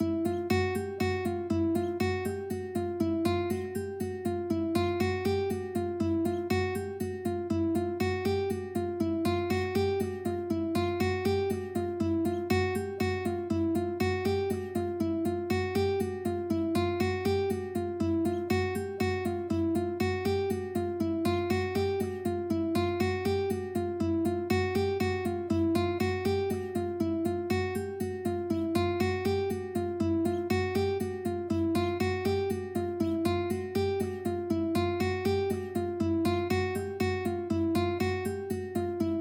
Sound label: 120bpm, banjo; Beyond Chord Melody Volume II